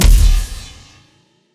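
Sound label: Laser gun Flange Cannon Fire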